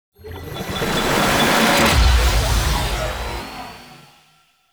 Orbital Station Elevator Loading; Warframe; Tau

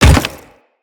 Step Default; Grineer Leto

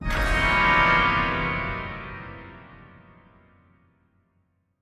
Organ Wrong Note; Polluter Organ

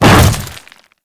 Hammer Boss Step Dirt, Footsteps; Warframe; Grineer Hammer Boss

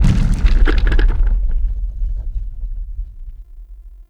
Large Cave Quake; Ambience; Warframe; Duviri; Murmur

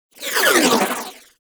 Igniter Pistol Proj By, Weapons; Warframe; Corpus

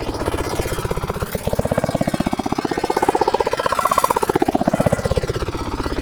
Trapper Drone Propeller Loop; Prime Grineer; Warframe; Tau

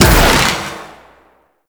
Shot, Weapons, Sentient; Warframe; Shedu

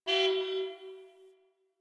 Distant Car Horn

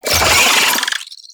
Wall Climb Movement Vocal; Warframe; Oraxia

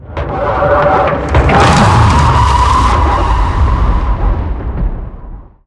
Tau Sentient Vessel Scream; Warframe

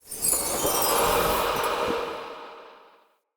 Casting Device Charge Heavy; Weapons; Player; Galene

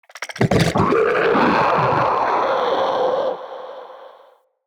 Shrimp Vocal Angry, Creatures; Vocals; Baliq Shrimp Glade

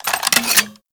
Rifle Primary Switch; Warframe; Weapons; Wisp Rifle

